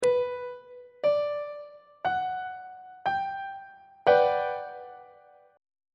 f double sharp major 7th 1st inversion on treble clef